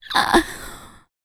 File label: FEM. HUMAN, Vocal, R&B & Dance